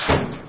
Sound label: atv ammo close; vehicles; Garry's Mod; hl2